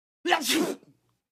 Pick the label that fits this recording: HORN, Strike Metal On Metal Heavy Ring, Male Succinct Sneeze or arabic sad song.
Male Succinct Sneeze